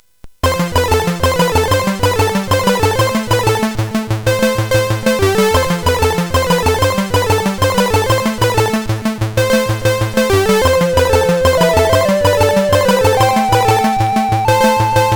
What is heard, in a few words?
sid CSG8580; Crying for help; hvsc